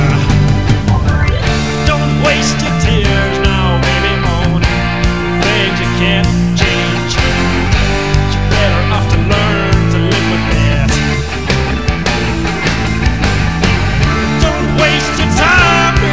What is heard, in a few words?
cryin